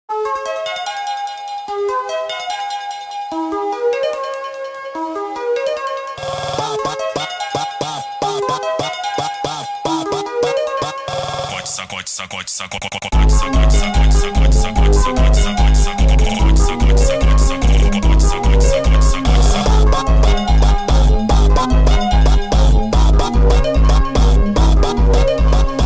dreamcore funk sped up

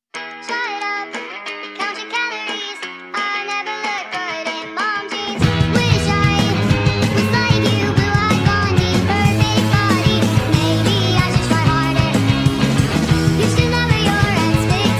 sped up

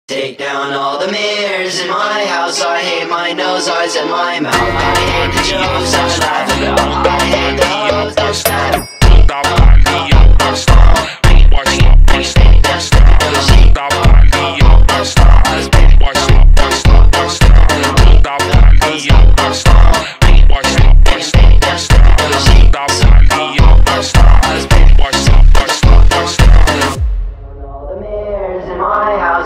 funk slowed

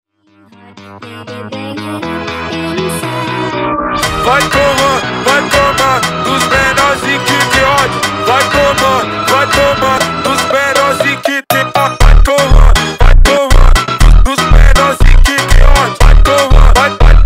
dirty shoes funk

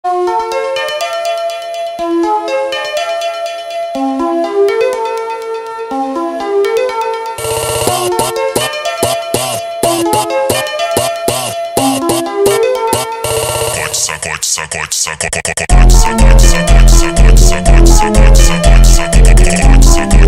dreamcore funk

